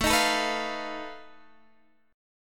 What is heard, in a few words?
F 7th Flat 5th A